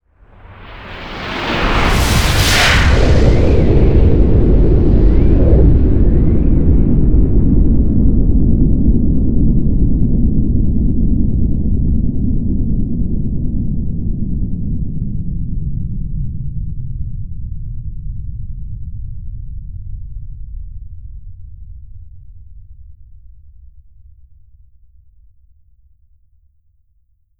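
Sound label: nuclear aftermath; Modern Warfare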